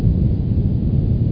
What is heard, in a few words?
gooo